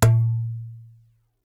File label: darbuka note